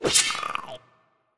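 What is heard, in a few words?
Skeleton Warrior Thrust; Skelleton Warrior Thrust1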